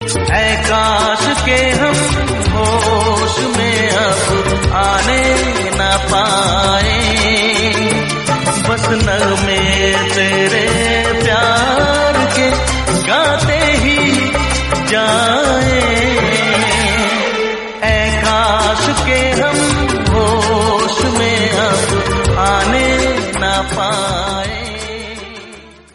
bollywood sad song

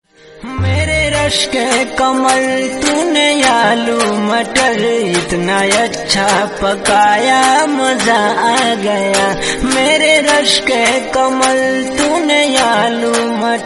bollywood ringtone

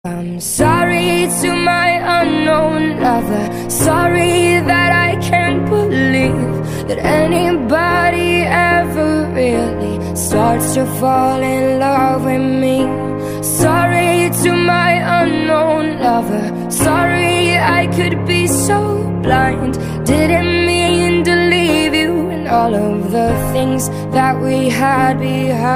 ringtone

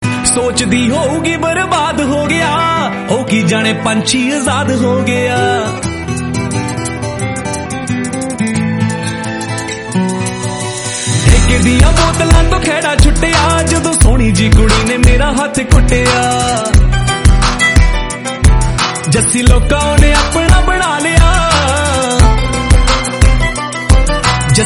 break up ringtone panjabi

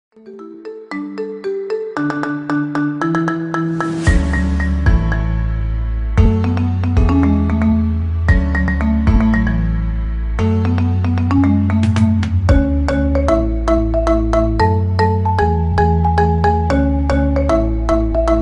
marimba ringtone remix

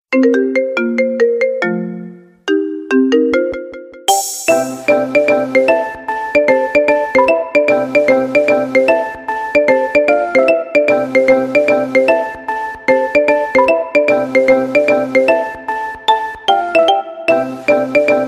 marimba remix ringtone

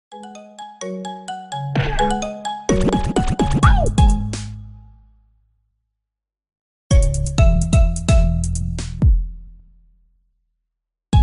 marimba ringtone remix

